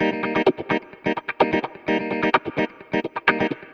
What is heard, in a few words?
Electric Guitar - D# maj, 128BPM; Electric Guitar Kit; Vengeance Samples; Special Sounds